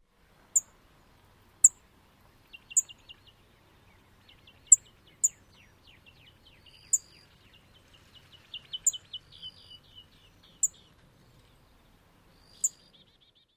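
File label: calls, bird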